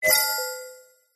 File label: science fiction computer activate tone